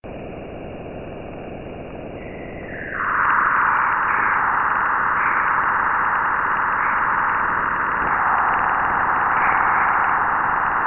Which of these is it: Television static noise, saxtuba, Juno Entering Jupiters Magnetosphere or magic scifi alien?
Juno Entering Jupiters Magnetosphere